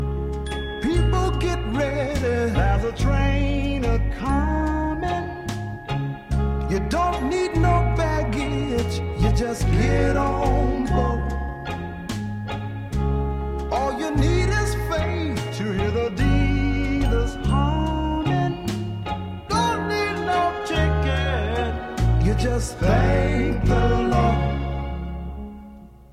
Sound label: 10 hole harmonica